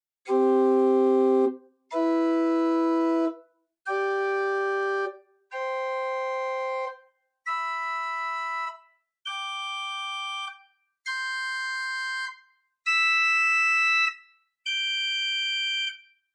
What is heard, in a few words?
Ped Mixtur arp; Grace Lutheran, Champaign